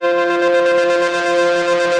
Synthesizer Middle C Notes, Lead

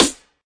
SNARE, Volca; drums full kits; style kits; WallsVado Volca Sample Pack; Hip Hop

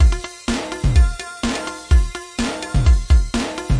ringing rocknroll, drum loops and breaks

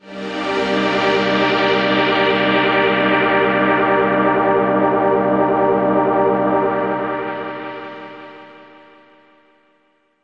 orchestral seventh; Pads

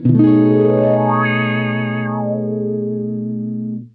wah chord B maj13, guitar

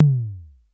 tom, Korg ER-1

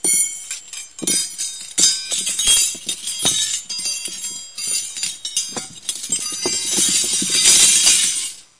clingling jingling metal; Various Strange Sounds and Effects

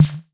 Yamaha TX-81Z SD; drum machines

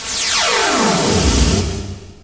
Electro FX; Ueb HE Electro FX

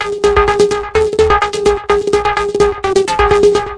hard repeating synth line; SYNTH AND ELECTRONIC LOOPS

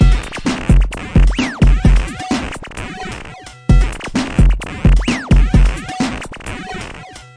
analog berlin, drum loops and breaks; soundsonic nks

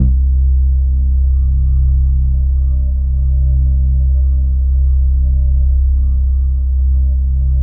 super space bass; Basses

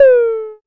electro perc; Hip Hop; Precise Electro Percussion; style kits

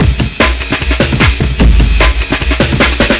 150 overloaded breakbeat; 141 to 160 bpm

